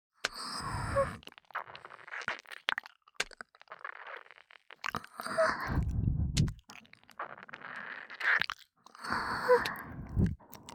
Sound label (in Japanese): (右) 吐息たっぷりまったり耳舐めエッチ, ASMR; 白耳; パンパン系効果音版